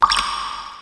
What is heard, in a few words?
menu back; osu!